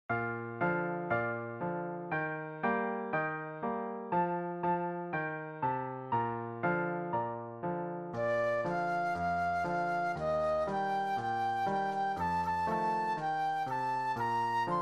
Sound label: Flute Solo